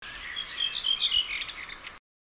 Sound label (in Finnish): Turili, Äijänsuo. Samantapainen kuin B, mutta yksi laskeva tavu puuttuu.; Espoon - Helsingin raja-alueilta alkukesästä 2008; Linnut